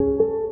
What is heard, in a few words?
Piano 174bpm Bm, LIQUID; DNBACADEMY